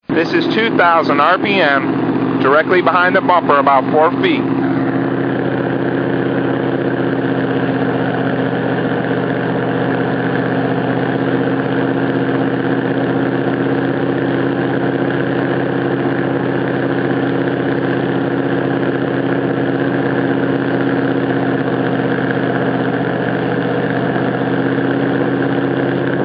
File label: Mustang, 1986 Mustang 5.0 with 3 disk 2½" CC Inserts Installed; Mustang Muffler